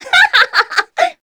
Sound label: succubus laugh spin; queen girl